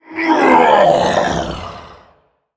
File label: Male Earth Elemental Death